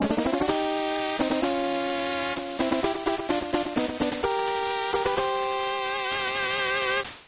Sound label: Stage Clear; Rocket Knight Adventures; Genesis